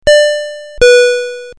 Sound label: ding dong